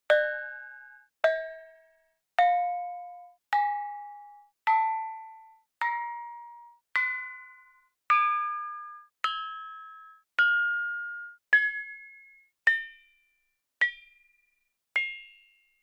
bonang panerus pelog

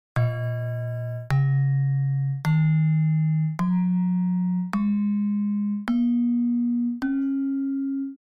gender gantung slendro

